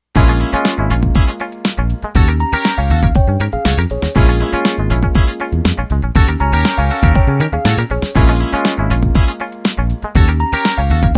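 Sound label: 8 beat analog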